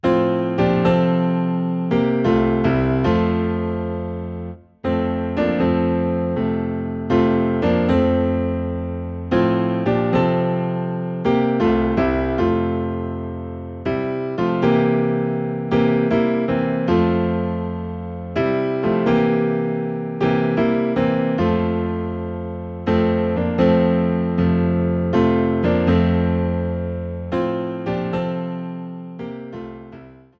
Rock of Ages, Piano Low in F

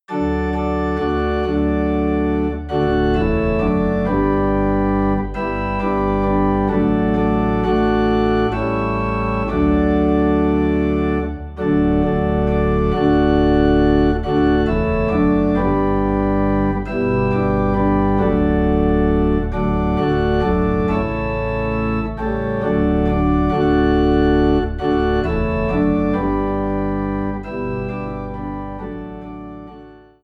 Organ Low in D; Higher Ground

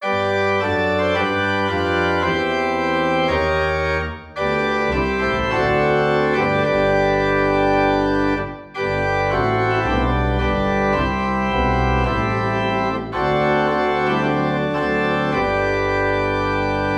Organ in G; By the Sea of Crystal

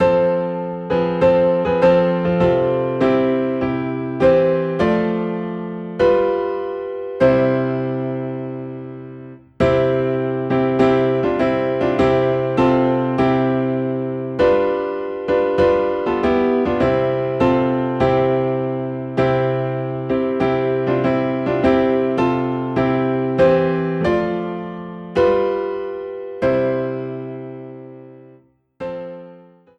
Piano in C

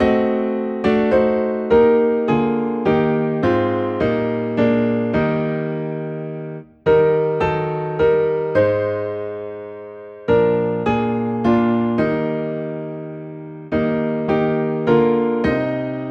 Piano in E Flat

